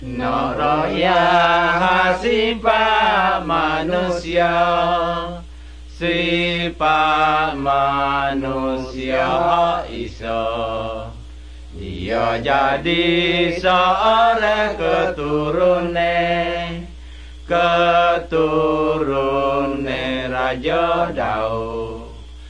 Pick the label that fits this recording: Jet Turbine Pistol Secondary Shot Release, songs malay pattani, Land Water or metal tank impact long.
songs malay pattani